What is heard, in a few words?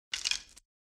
Grab arrow